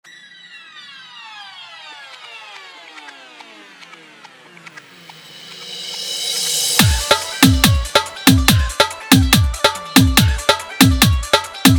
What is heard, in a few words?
Bhojpuri Old is Gold Dhollki Bass Remix